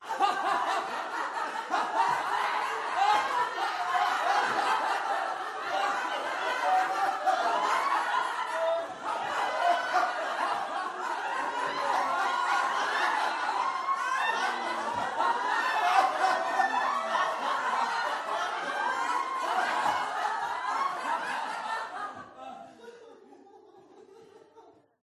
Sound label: small mixed group of 30 people laughing hard with decreasing intensity; Sound Ex Machina